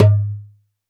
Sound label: Doholla Dom, Roland BK-5 Percussion